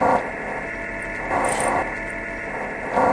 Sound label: repeating tonal noise; LOOPING AMBIENCE